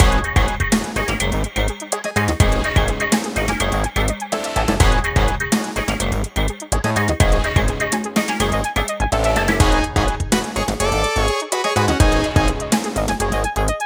125 bpm; fashion police squad beta

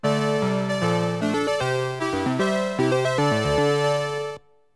Defeat; Konami Game Music Volume 2

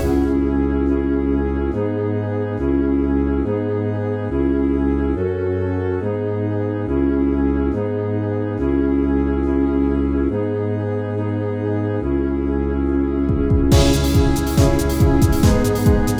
Factory Beat; starlight alliance; switch windows

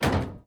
robot step; teardown ps5 windows xbox series xs gamerip 2022

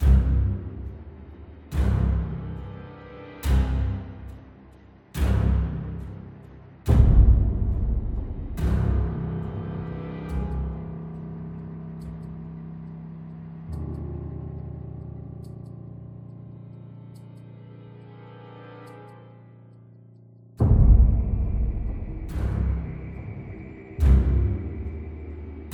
Slow Danger LOOP; unrest indigo

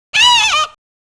CHICK

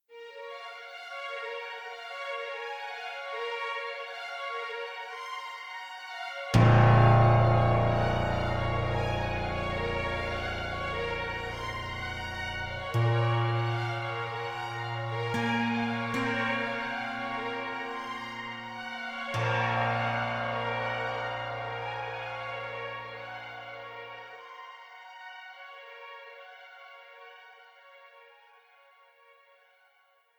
Phrase Dramatic Strings

